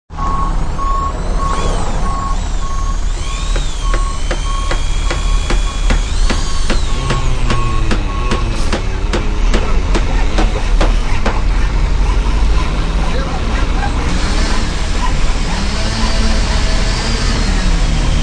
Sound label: Various construction sounds, tools, and vehicles in a sound design montage; Digging